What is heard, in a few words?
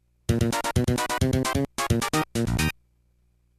game over; nightmare